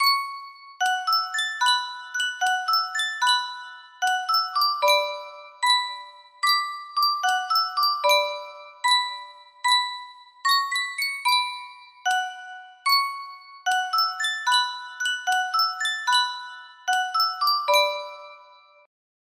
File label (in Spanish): Yunsheng caja de musica